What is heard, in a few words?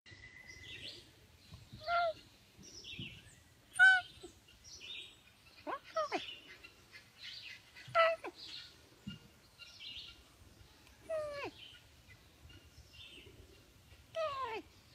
Lynx meowing